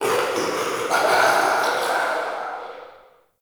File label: Crypt Spirit Vocal Death; Enemy; Champion Crypt Spirit